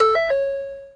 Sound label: warning belt